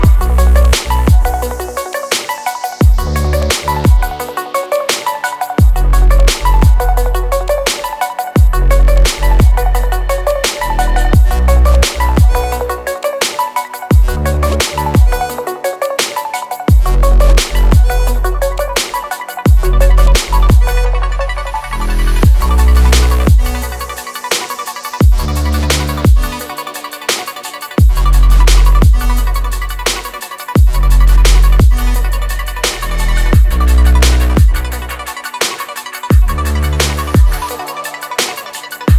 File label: technology documentary loop